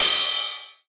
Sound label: Ride, Techno Drum One-Shots